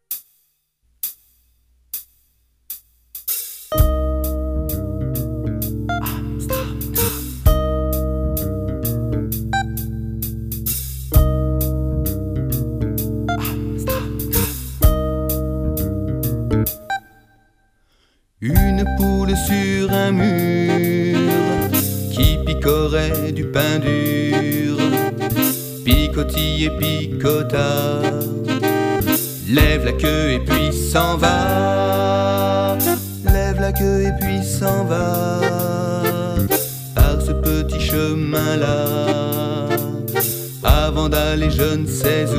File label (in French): ensemble vocal variété jazz; chant du monde